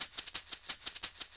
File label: Shake 175, Drum&Bass Perc Loops; EZRollers